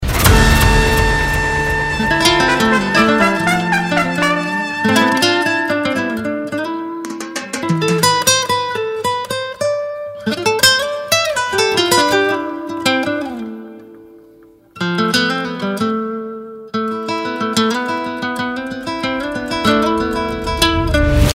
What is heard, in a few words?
outro bgm; Bull Stampede